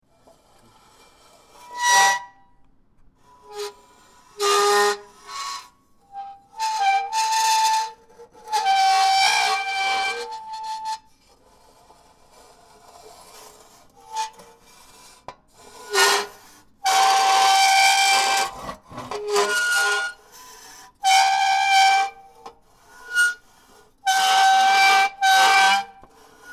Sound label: screeching letter g